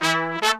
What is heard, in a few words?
BR 110, BRASS MURGA; Best Service ProSamples vol.14; World Grooves; AKAI